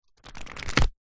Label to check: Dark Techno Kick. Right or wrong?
Wrong